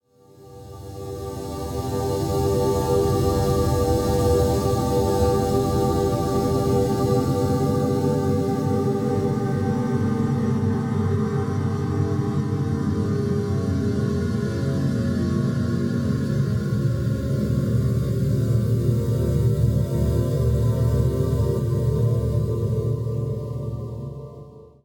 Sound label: Warped Music